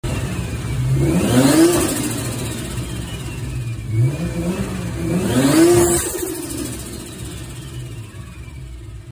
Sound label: turbo stututu